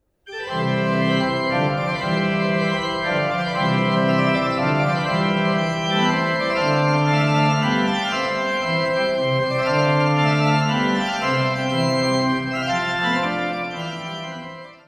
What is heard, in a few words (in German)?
Courante Suite C Dur; Gottlieb Muffat